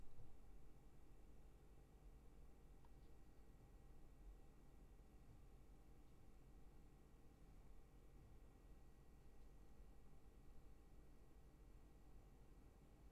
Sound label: Room tone